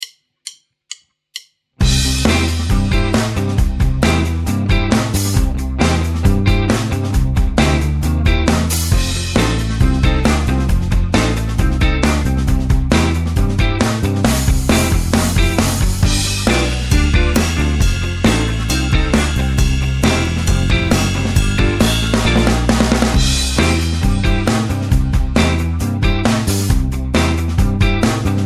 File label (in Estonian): Rocknroll saade